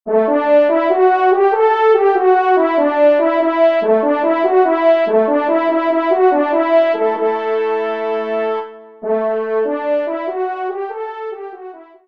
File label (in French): Reveil